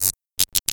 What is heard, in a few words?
fizzle; OneShot Fading Memory